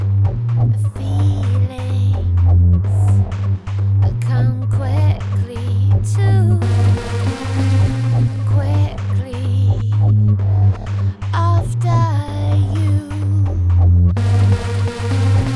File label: harmonic